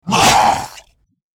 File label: hit, troll